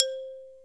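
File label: CHINESE XYLOPHONE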